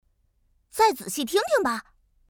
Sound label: wrong; sunzibingfa3